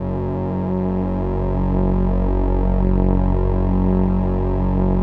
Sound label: LAMBDA, String Machines